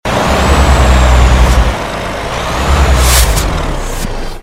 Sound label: throttle off; TDMCarpack; TDMCars